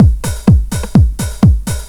LOOP; SoundFactory; DRUMLOOP

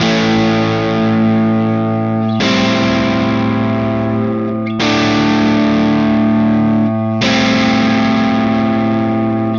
Electric Guitar, Loops; Guitars

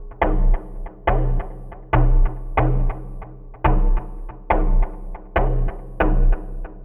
Electronic Percussion

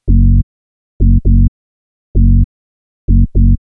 Bass; pitchshifter PSI

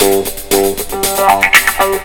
LOOP, 70S; SoundFactory

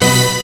HIT, STAB; SoundFactory; SMPMANIA

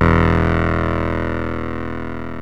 yamaha rm1x synth bass and lead

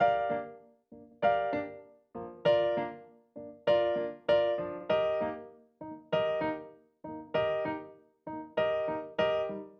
Acoustic Piano

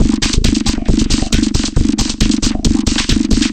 Dance Drumloop; Drums; Dance Beats

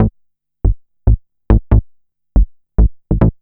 Synth Bass; Basses

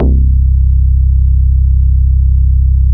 club bass; SoundFactory

